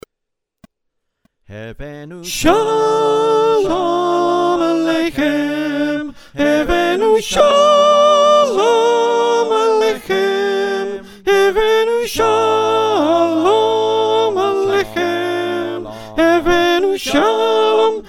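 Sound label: soprano, choir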